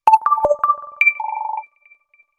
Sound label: Msg 3d; X7